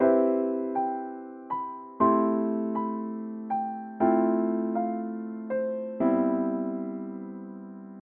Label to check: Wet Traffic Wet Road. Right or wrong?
Wrong